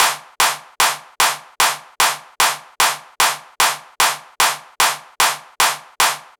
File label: Hardstyle Kit G#m 150bpm Clap; Drums; EDM Drums; Drum Kits; Stems; Ghosthack; Ultimate EDM Drums; Drum Mega Bundle; Hardstyle Kit 08